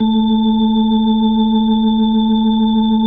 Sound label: A3F, B3, Vintage Keyboards